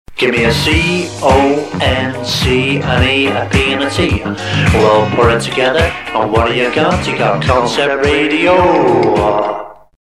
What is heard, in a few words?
Jingle; Concept Radio; Merseyside